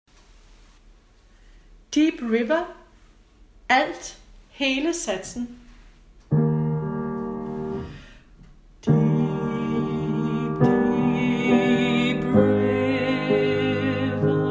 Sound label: Deep River - alt